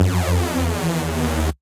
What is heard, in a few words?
Hoover Down, Bass; Ableton; Skitter and Step